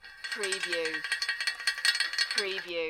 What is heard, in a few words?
Metal Chain Pulley - Rattling Chains